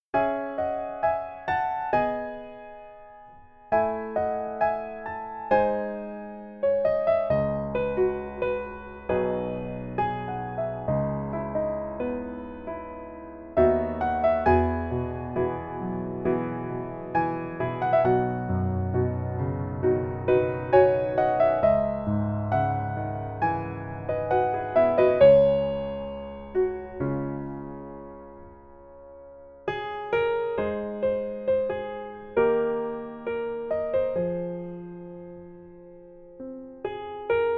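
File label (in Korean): Piano version 멜로디MR 남자키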